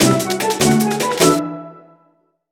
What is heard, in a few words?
Token Drop end Jingle; Wii Party U